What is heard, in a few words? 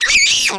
small death, spider; Fate; MONSTERS